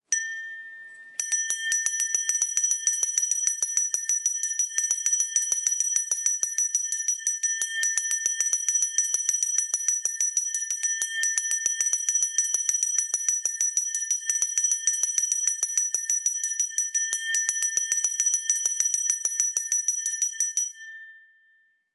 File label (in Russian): V kolokolcgik udarjjaut snachala odin raz potom neskolko; Колокольчик звенит один раз, потом несколько